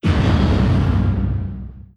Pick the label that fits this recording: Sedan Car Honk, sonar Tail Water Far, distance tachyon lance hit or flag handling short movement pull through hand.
distance tachyon lance hit